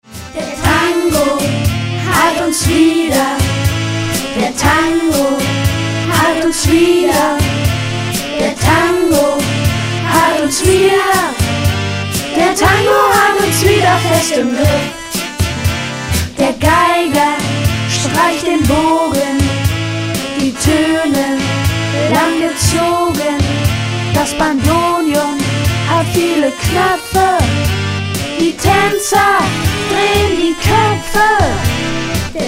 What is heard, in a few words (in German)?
Tango